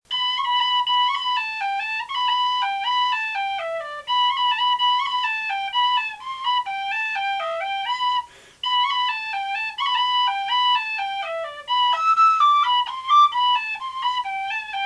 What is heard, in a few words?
Pipes; Craigs